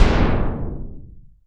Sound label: NOISE TYMP, BASS DRUMS; Zero-G Ambient 1